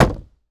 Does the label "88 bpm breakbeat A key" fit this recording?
No